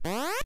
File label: Bird Out Of Hole; Metroid (USA)